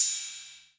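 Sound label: 808 cym, cymbal, drum machines; 808 Extended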